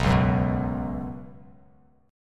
B Suspended 2nd, Bsus2 chord, Bass Standard; Bsus2 strummed; 7,4,4,4; JGuitar

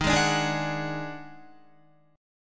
Eb Minor Major 7th Double Flat 5th Eb down Guitar; EbmM7bb5 chord; JGuitar

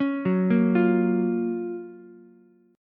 Gb Major 7th Gb Ukulele; GbM7 arpeggiated; JGuitar

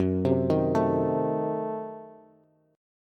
Gb Diminished 7th Bass; arpeggiated; JGuitar

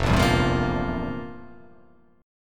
F Major 7th Suspended 2nd Suspended 4th, chord; FM7sus2sus4 strummed; JGuitar

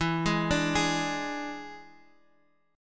E 7th E down Guitar Standard; E7 arpeggiated; JGuitar

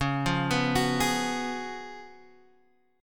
C sharp 11th C sharp, Guitar, Standard; arpeggiated; C#11; JGuitar

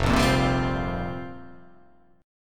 F Suspended 2nd Suspended 4th F down

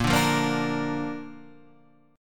A sharp Major Bb, Guitar chord

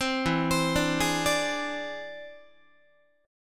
E Augmented 7th E down Guitar; E+7 arpeggiated; JGuitar

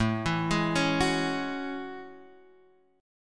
A Major 13th, Guitar, Drop D; chord

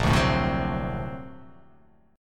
A sharp Augmented Major 9th, chord; strummed; JGuitar

